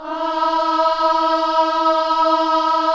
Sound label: KIDS CHOIR, Gospel